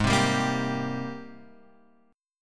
Ab Major 9th, down, Guitar, Open F; AbM9 strummed; AbM9 chord; JGuitar

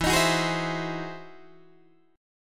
E Augmented Major 9th, Guitar, Open D

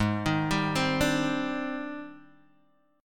Ab Major Sharp 11th Ab down Guitar Open G

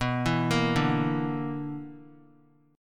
B Minor Major 7th Double Flat 5th B, Guitar; BmM7bb5 arpeggiated; x,2,4,3,2,x; 4F,4A,5C,5G,5C,6E; JGuitar

